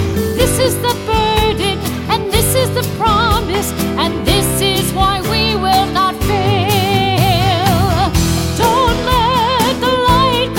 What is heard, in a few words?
Chorus for Congregation